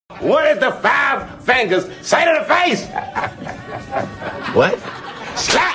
slap

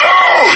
pain; cloaker

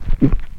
beer gulp

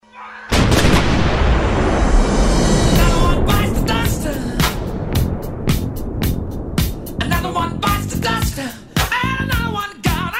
death sound

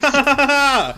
hahaha

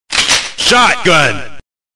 shotgun; metal slug

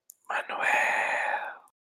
asmr

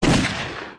gun sound; house of the dead 2

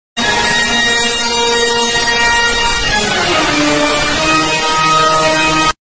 lobster jumpscare

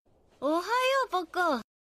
notification sound; ohayo pokko

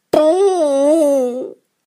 boooooo

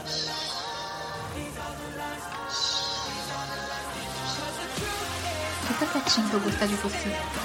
shhhh

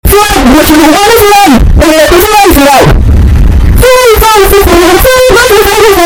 earrape

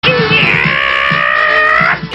angry snarf

